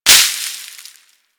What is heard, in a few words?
Forest Pinecone; Ambience; Props; Warframe; Grineer Forest